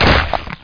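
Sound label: fall death; tnpdemo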